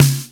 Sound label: SNARE, WET SNARE; AKAI S6000 CD-ROM - Volume 3